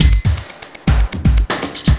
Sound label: Beat, Industrial